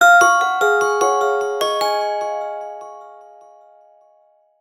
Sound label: htc e8 saffron ringtone